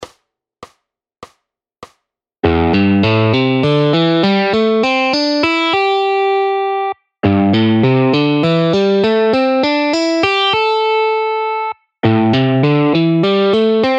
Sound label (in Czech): C dur pentatonická, stupnice